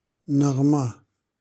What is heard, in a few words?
melody